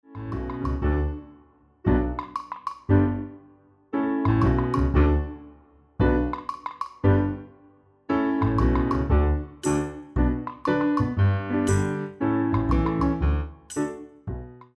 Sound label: accompaniment